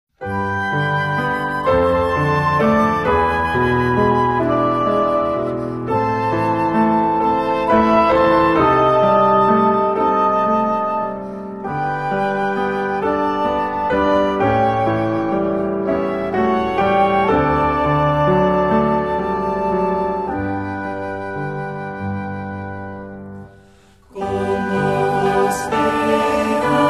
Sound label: vocal and instrumental, hymns spanish